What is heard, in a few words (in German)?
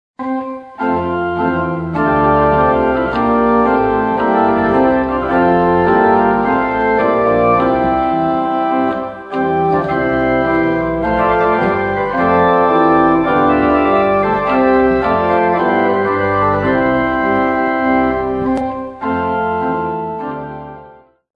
Feierlicher Marsch; Haendel